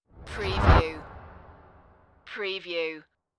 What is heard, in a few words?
Rush